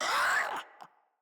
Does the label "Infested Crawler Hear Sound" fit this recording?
Yes